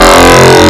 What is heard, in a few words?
Spectrum Harmonic, wavetables; Animoog